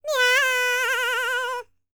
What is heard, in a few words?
PERSIAN, World Voices; ILIO; Vocal Planet VOL-5